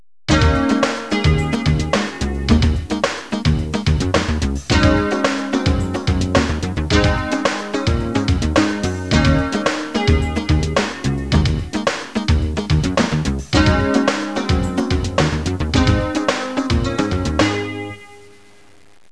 suonerie businessy

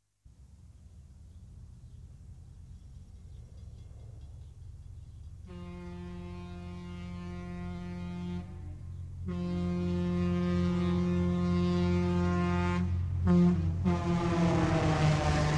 TRENO PASSAGGIO; trasporti; treni

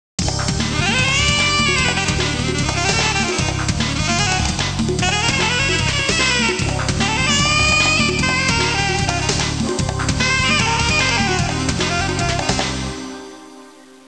suonerie musica jazz

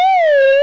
Scivola Giù, Cartoons

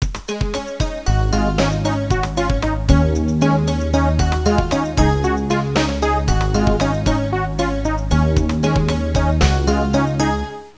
suonerie contemporanea; musica contemporary

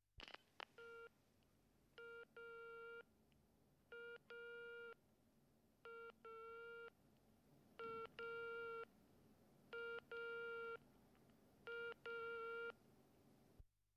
DISTURBO TELEFONO; phone

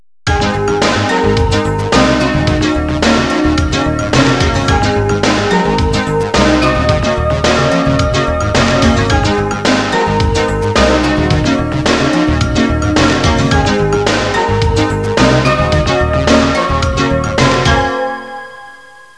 suonerie businessy

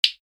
suoneria botton; beep

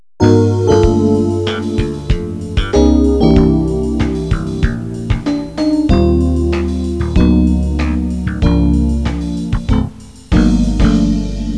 musica newage

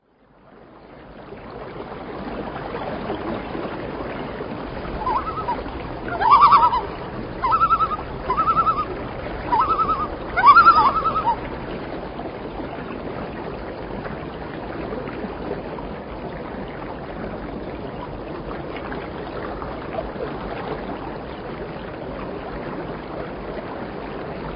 uccelli birds, animali

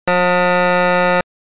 suoneria beep

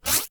suoneria botton; beep

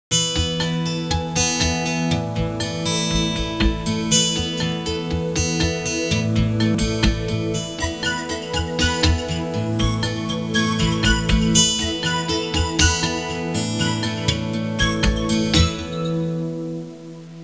suonerie musica hawaiian

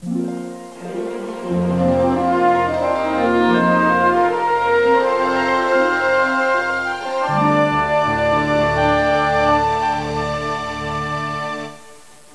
suonerie classica; musica classical

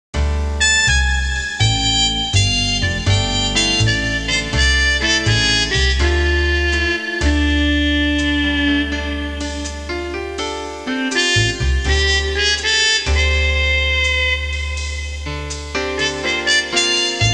suonerie musica jazz

